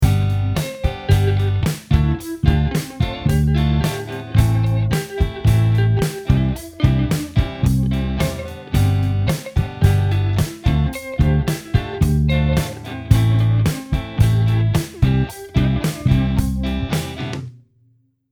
ベースライン ギター

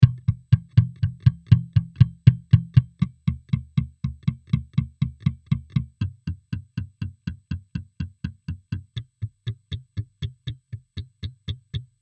ベース ゴーストノート ピッキング ゴースト

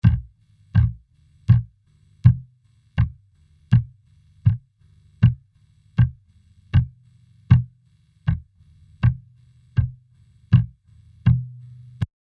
ベースゴーストノート打撃ゴースト

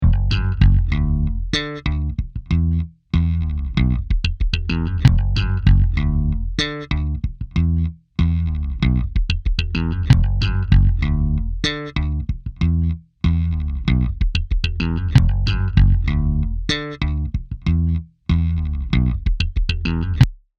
ベーススケール あり

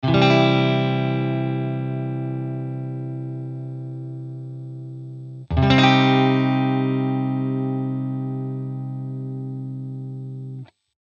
ギター マイナー・メジャー

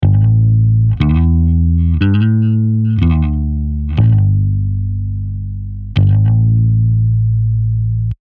スライド

